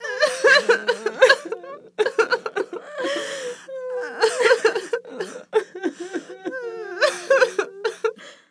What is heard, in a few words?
Human Female Cry Group Light Cry